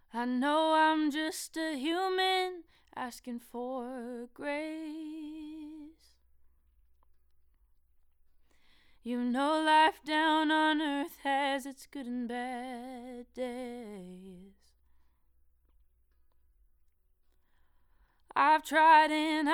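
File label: Female Solo, Neumann U67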